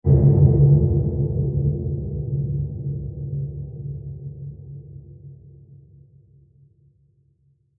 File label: Diffuse Low Impact Blast; Low Impacts (Sound Effects:Impacts)